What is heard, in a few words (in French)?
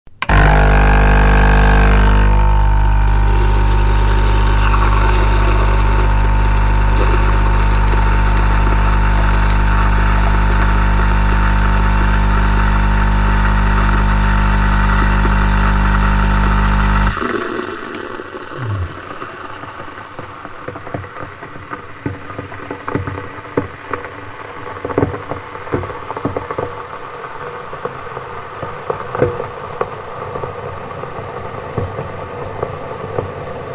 bruit d une cafetiere